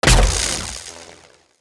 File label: Voltaic Sword Projectile Impact; Characters; Enemy; Abilities; Sinecure; Banneret